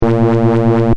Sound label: Sci fi machine loop, Scifi Machine: Loop, Short; Control Panels; Stuart Duffield